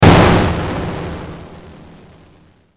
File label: Hit Explosive Static; Blastwave